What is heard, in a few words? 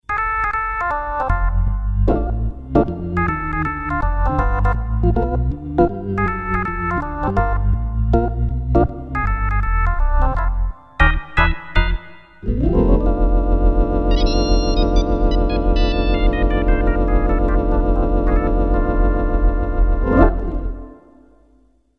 Tone Wheel; Crumar Mojo Desktop; Max Tempia